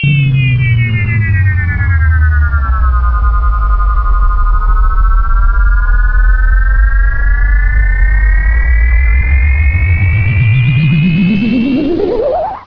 spaceship; quake2; coop; slaughter

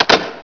locked; quake2; coop

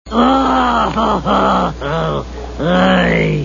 ohohohh, cow; quake2

